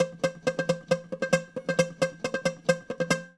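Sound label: bouzouki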